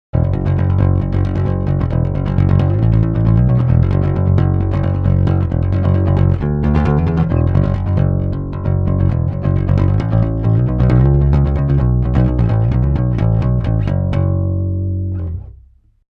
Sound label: Pick; MiniMax